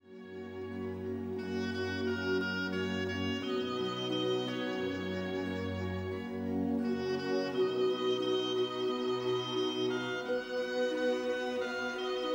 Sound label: Instrumental